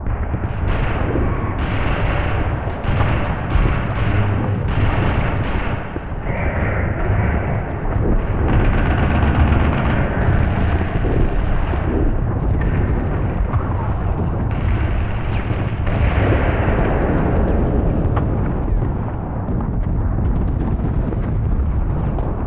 interior battle loop; Quake 4 Alpha

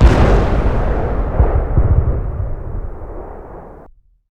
flak explo; Quake 4 Alpha

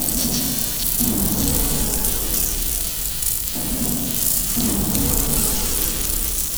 cryo loop; Quake 4 Alpha; id software

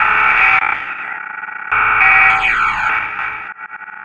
computer alarm; Quake 4 Alpha; id software

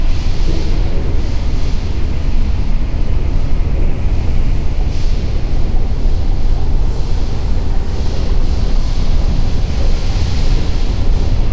cryo huge machine amb; Quake 4 Alpha; id software

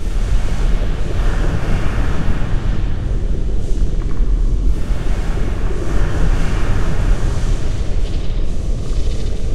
cryo big creaky mach; Quake 4 Alpha; id software

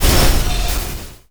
tank step, strogg; Quake 4 Alpha

